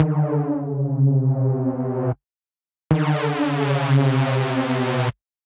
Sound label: Bass A Minor 165 bpm; MIDI Clips; Ableton; Factory Packs; Skitter and Step; Downer